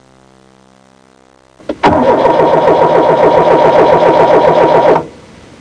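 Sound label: starting; Sound Library 2000; Wayzata Technology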